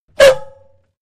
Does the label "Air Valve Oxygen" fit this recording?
Yes